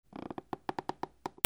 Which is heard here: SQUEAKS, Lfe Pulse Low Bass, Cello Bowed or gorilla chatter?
SQUEAKS